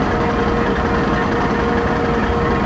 Facility

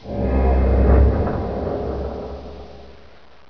groan; dm borehole

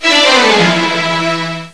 arab start

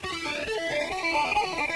rush

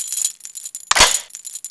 mg34 mag in, weapons; svencoop; ww2projekt

